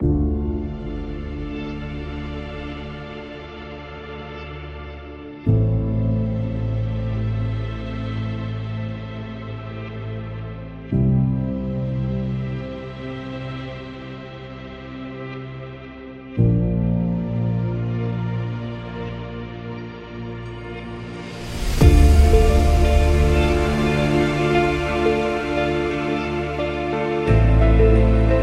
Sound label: Documentary; epic action